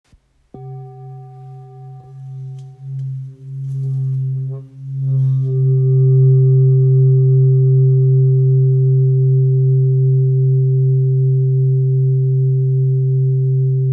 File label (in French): Bol full moon grave do 1.475kg